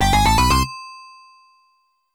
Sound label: Fanfare; Viper V12 RS; FM Towns